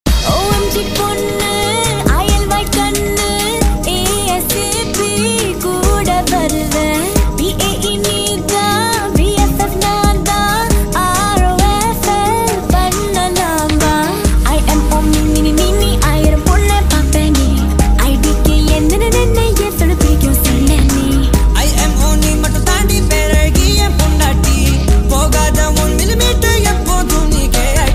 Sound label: tamil ringtone